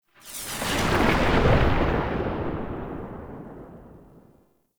Sniper Tail; Warframe; Sentient; Caliban Prime

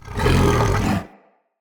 Corrupted Battle Dog Stun End; Animals; Vocals

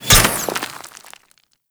Kunai Impact Dirt; Warframe; Hit Effects; weapons

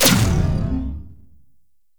electricity hazard pulse; Gameplay; Entrati; Vaults

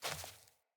Sandals Walk Dirt; Player; Footsteps

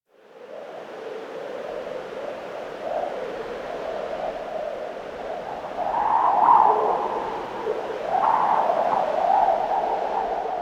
SFX Final Wind; Warframe; The War Within; Mountain Pass Return Peak; Cinematics

